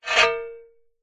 medieval weapon metal heavy scrape pick up

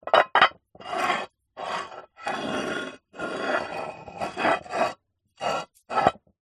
terracotta roof tile movement on top of other; zapsplat

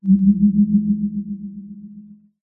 science fiction hum burst satellite swell; zapsplat

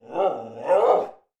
animal dog labrador growl internal

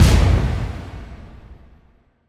Impact Boom Crash; bottlerocket

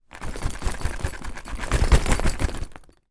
suitcace small empty shake; foley

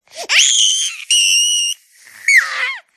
12month baby SMOOCH excited scream laugh giggle

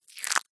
food cereal cornflakes handful squeeze crush

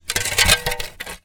shovel drag on soil; industrial

